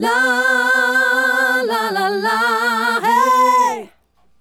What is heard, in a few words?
Female Group, Hip-Hop and RnB Vocals